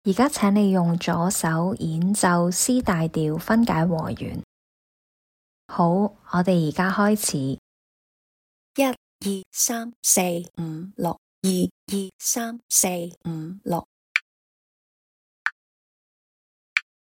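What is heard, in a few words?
C major broken chord left hand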